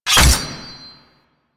Crafting Equip, UI; Warframe; Cetus

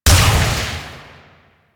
Shot Small; Weapons; Warframe; Duviri; Incarnon Adapters

